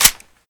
bolt forward; weapons

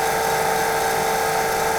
eat duh cash loop, atm

